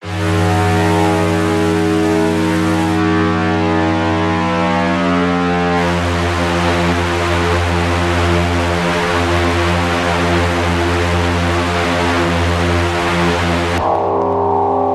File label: stretch phazeon; Gregor Quendel